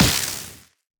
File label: aether medium; action; impact